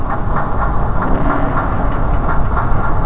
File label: mech spin